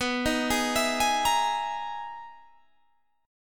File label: E Major Sharp 11th B